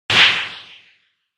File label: punch; 攻撃